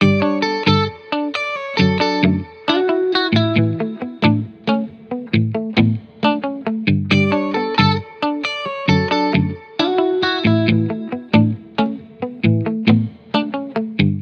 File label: Guitar, 135 bpm, key D, Back to the 70s Guitars; Fox Samples; Full Mixes